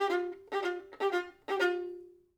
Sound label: violin